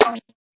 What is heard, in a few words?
tight hit; Hip Hop; STYLE KITS (Hip Hop, Etc)